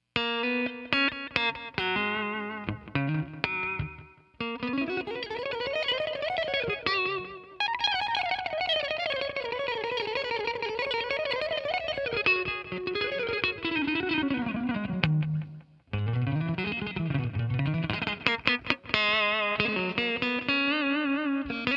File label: strat test